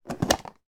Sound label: margarine tub lid remove